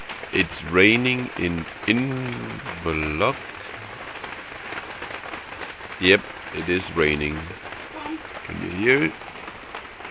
rain inverloch foreshore sitting in a campertrailer